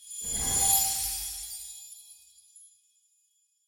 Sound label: activation intro; wilderland